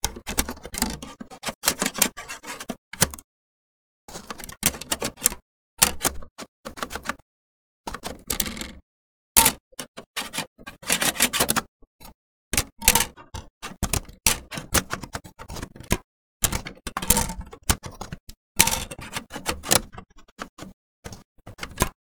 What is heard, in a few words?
desktop computer - disk loading and unloading from dvd tray; devices accessories utilities; NS Studios - ultra sound collection